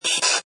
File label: Button Electrical Static; Scifi Production Elements, Set 2; Lynne Music